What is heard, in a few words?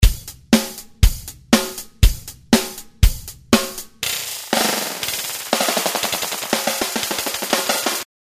delay